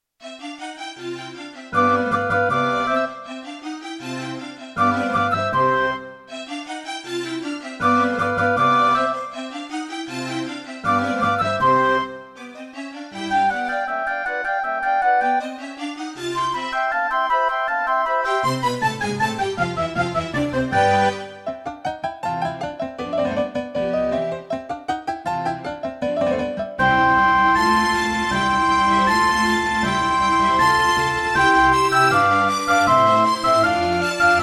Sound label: Yamaha MU90; classical; Mozart; Concerto K467 partial